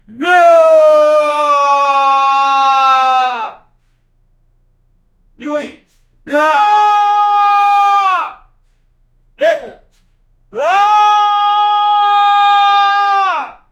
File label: mild fear scream of 3